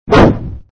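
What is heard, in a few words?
SFX sound (scythe swing)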